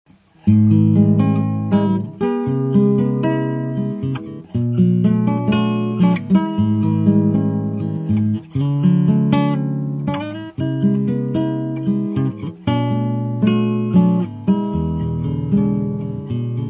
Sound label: guitar